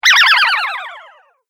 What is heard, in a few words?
Game App Skid Fail, Video Game Sound Effects